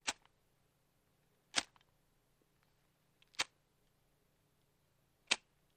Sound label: Zippo Lighter multip; lighters & matches